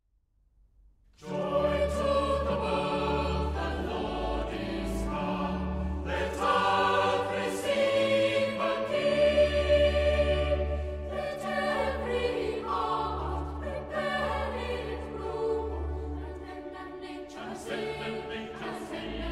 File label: Closing Hymn Joy To The World; Christmas Eve; Holy Trinity